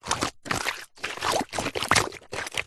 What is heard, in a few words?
foley wood stick movement slide draw line in sludge wet mud squelch